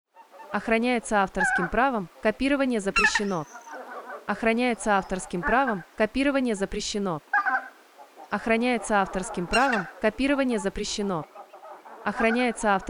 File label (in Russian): белолоб пара стая, призывы самца и нескольких самок на фоне присады.